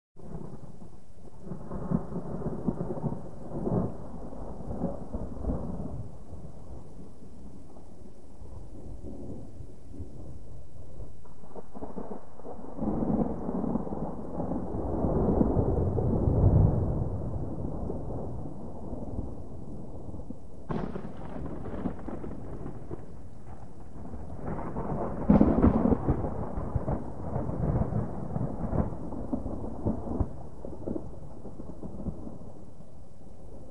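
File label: Thunder Long Rumble, distant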